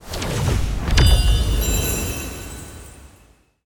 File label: load